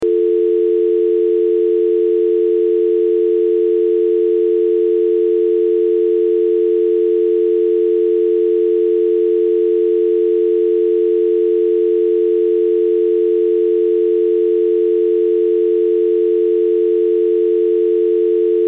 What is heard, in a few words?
Telephone